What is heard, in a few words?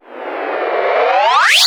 Sweeps Short